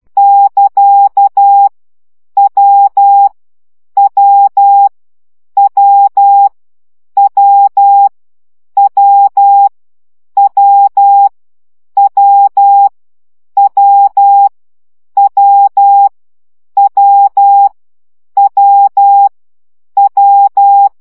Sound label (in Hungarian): CW gyakorlas w 10 baud